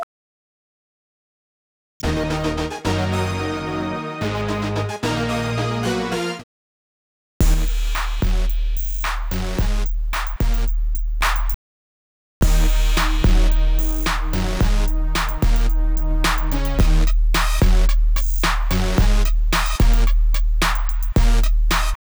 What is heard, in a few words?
MUSIC; Back To School